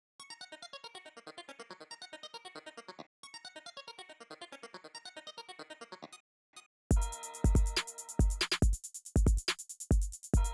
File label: Trap Remix